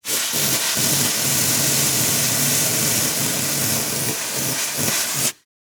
Emergency Fire Extinguisher Spraying; emergency effects; AdobeCC

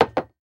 Household Glass Set Down On Table

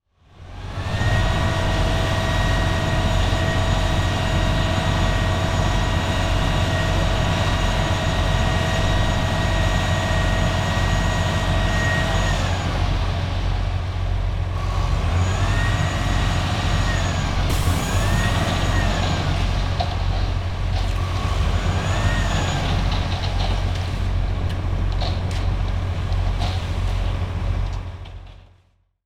Industry Cement Truck Pour Cement

